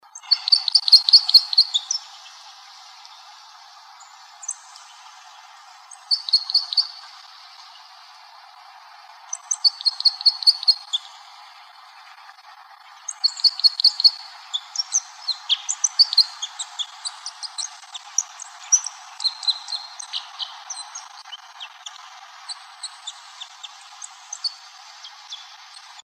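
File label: Red Crossbill, Finches; RECR; Coons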